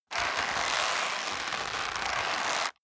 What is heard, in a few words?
leather creak hard